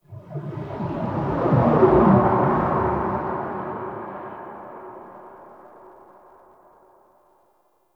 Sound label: Exterior Space Waves, Ambience; Warframe; Railjack